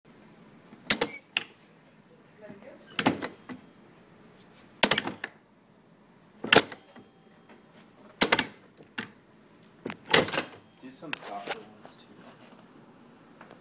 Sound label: Train Connect